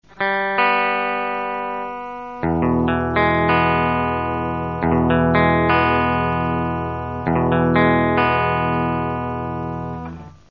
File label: ear tune